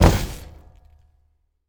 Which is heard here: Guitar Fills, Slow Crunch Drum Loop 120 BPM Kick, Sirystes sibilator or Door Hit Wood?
Door Hit Wood